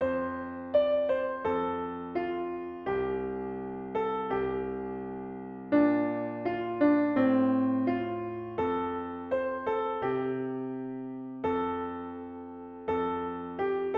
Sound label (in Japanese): ピアノ